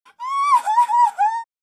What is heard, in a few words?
Terrified female scream